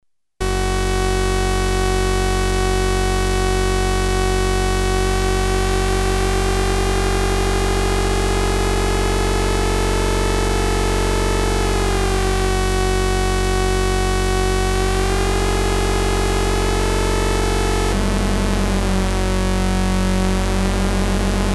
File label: xmod, Polivoks formanta; TEST: OSC XMOD